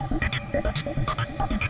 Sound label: TECHNO SYNTH 140; Keys